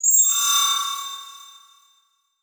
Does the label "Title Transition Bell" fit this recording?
Yes